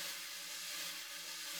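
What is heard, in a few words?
fast brush; Northstar - New Gold VOL-2; 30S BRUSH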